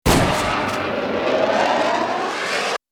Bullet Time; FXHome; Bullet and Gun

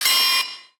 Tech, Weird Side Samples

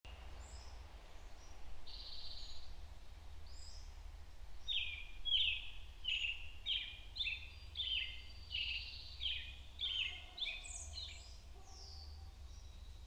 Ambient Birds; FXHome

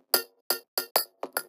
Percussive Elements

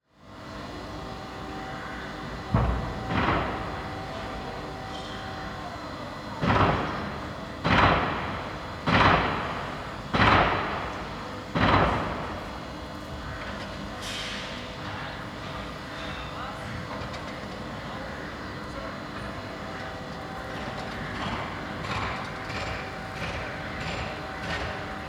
Just Ambience Construction Site; SoundBits

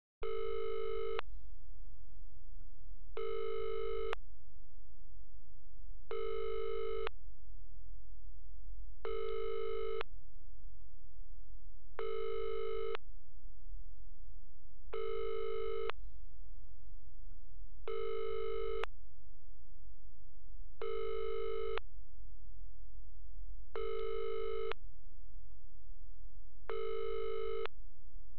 BT Lines AND Tones, Pabx Ringi; Computers Printers Phones; BBC Sound Effects Library

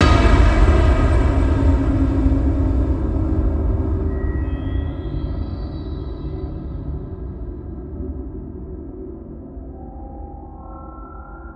Long Drone, Cinematic Impacts; 99Sounds; Rumore

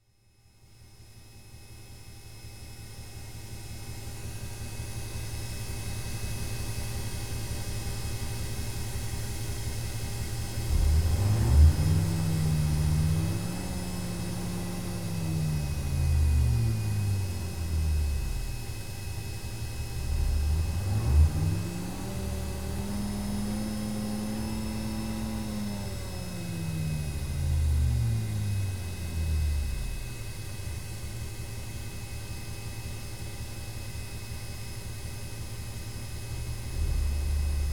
Warfare, Chieftain, Interior; BBC Sound Effects Library; Explosions Guns Alarms

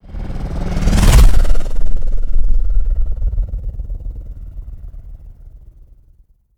designed passbys alien space; SoundMorph; Road Riders

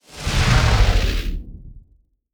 Just Whoosh; SoundBits

